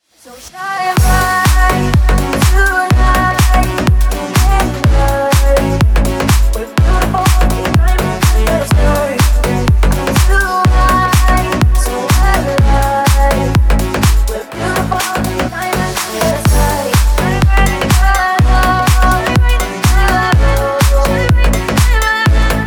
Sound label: remix